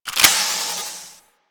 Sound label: Experiment Pistol Reload; Crp Sent Experimental Pistol